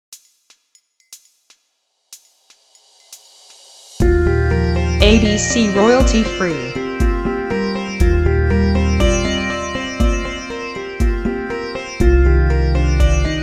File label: ambient